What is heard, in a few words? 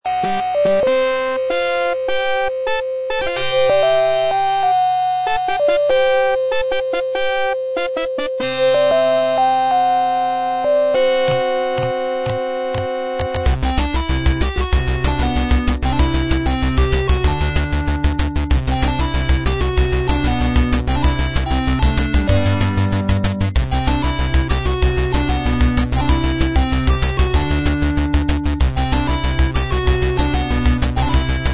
adlib; sq3